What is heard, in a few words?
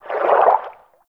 Fish Tank Movement Quick; Hub; Liset Personal Quarters